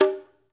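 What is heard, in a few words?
brush conga, right; Spectrasonics; Liquid Grooves 1 & 2; CONGAS+BGOS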